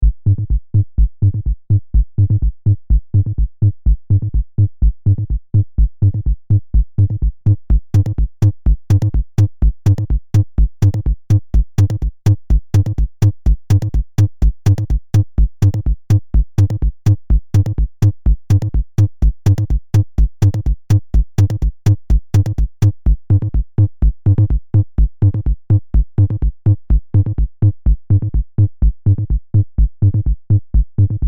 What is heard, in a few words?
Jomox ModBase 09 MK2 BD Bass HH